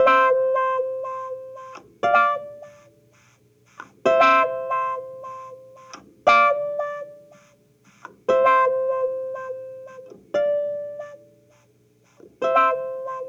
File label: Cruisin' Disco Clavinet, 70s Analog Funk